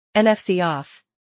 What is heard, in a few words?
NFC OFF, tones; HA-MIC05R2